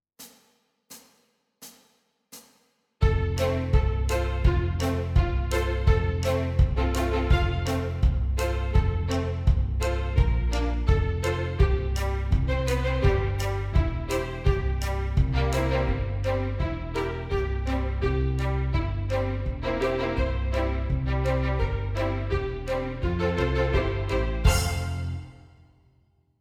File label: Tempo 84bpm, strings